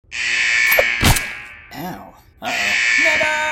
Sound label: Grenade + Crossbow; Fleeing The Complex